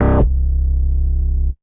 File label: Octave Bass